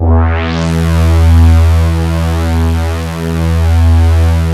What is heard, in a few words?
DRONE MULTI ZONE E1; Best Service Alpha Dance vol.2